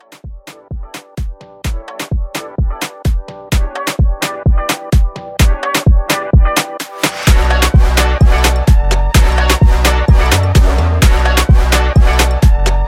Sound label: Afro Overhaul 128